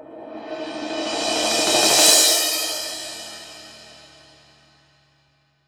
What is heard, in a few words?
cymbal swell; Voice Crystal - Steve Reid Definitive Percussion